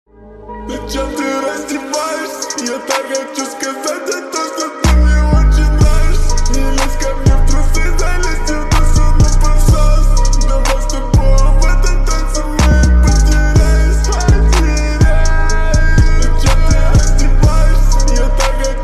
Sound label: slowed reverb